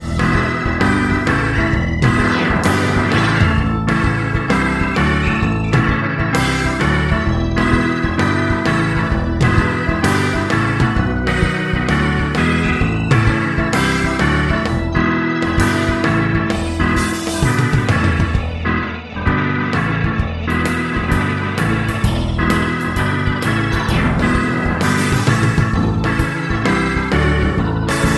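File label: hammond b3 fast leslie speed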